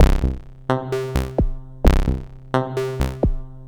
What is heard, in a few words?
MODU C, Modular Synth Loops